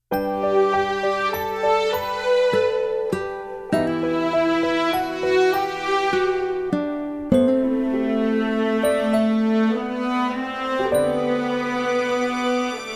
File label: Little Bird Strings; The Time We Were Not In Love; Korea